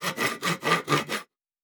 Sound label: Saw Wood; Fantasy Sounds Bundle; Craft